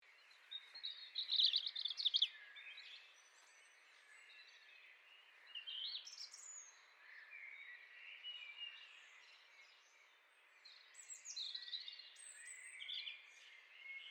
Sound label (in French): Rougegorge familier